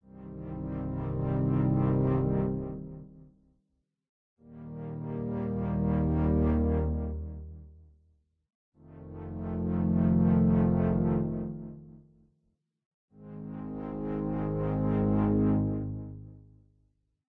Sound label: walking; Monster Breeder OST